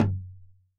Tom Acoustic Crush Mid Chorus

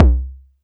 BD

